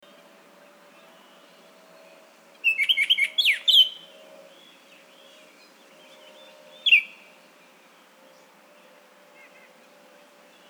Baltimore Oriole Icterus galbula

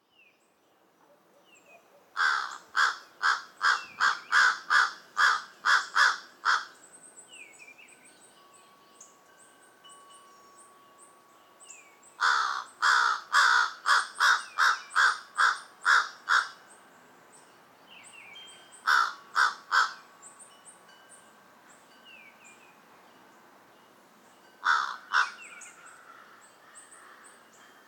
American Crow Corvus brachyrhynchos paulus

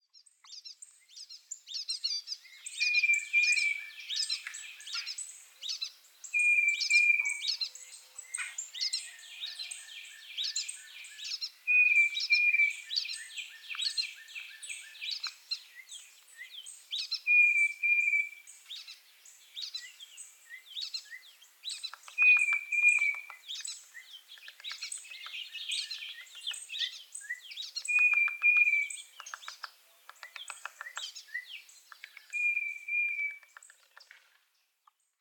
Tufted Titmouse Baeolophus bicolor

